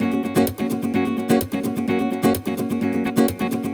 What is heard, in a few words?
Nylon Guitar F# maj, 128BPM; Vengeance Samples; VEH3 Nylon Guitar Kit 1